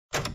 drop trigger box

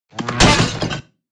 drop flowerpot miss

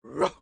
dog short

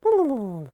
turkey indifferent

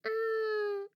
koala indifferent

